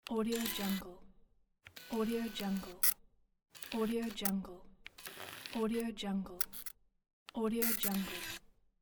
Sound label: digital camera